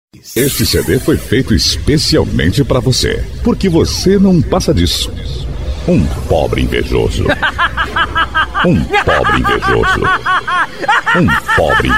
abertura

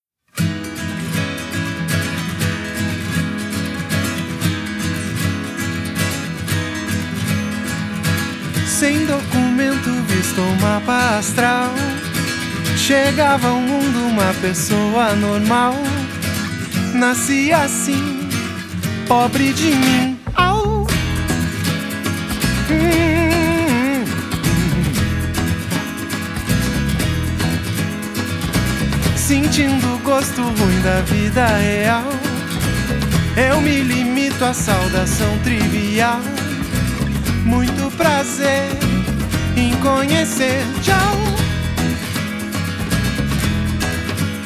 acustico

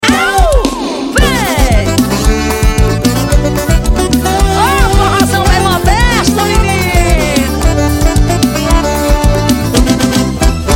forro

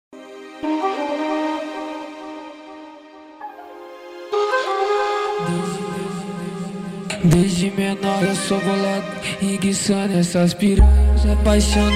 eletro funk

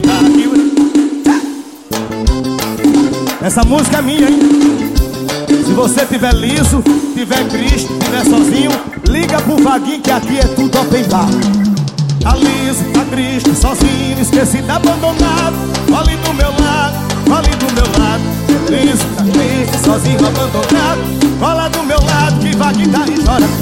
forro

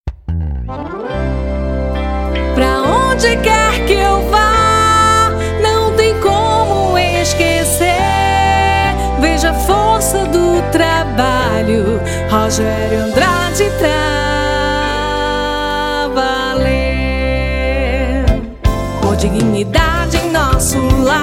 forro

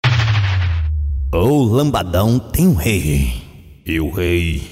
sertanejo vinheta

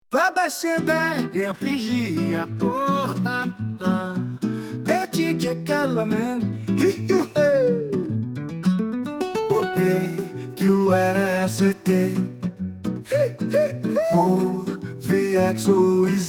samba

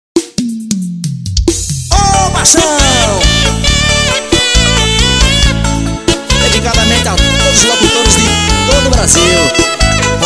arrocha

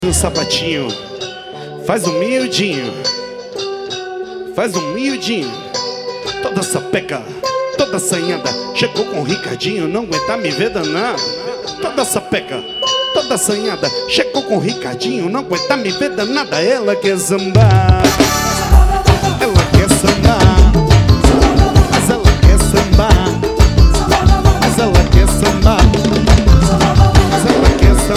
samba

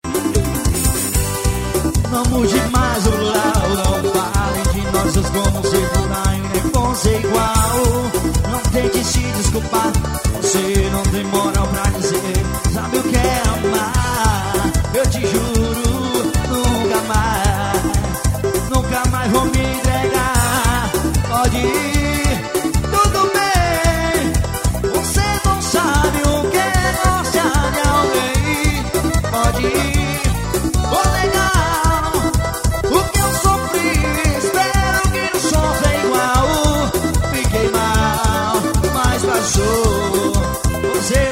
forro

